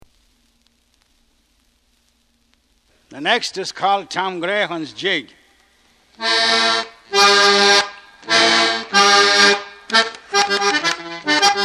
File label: Jig; Tom Grayhans